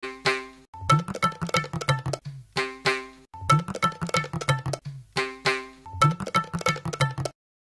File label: tabla